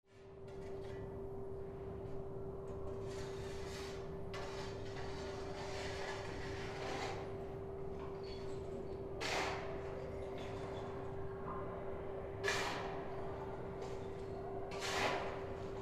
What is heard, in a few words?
construction inside